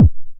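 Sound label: 909 BD tape, kick drum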